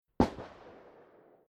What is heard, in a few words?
Firing Range Gun Large Rifle Single Shot Distant Blast; Weapons